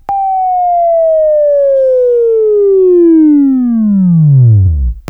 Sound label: Buzz, Left; Labview-Program; Sweeping Training program